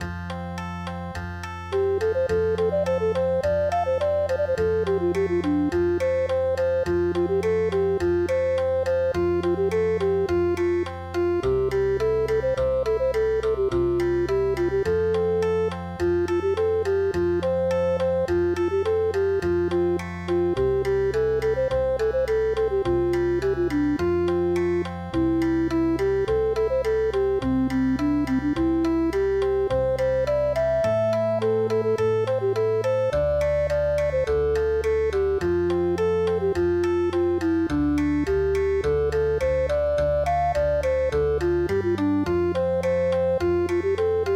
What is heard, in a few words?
okinawa, midi